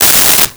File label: Hydraulics, Mechanical